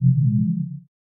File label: splash; noteye; roguelike